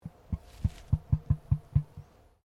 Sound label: Bird Emu Queensland Throat Noise; Birds in Queensland; Animals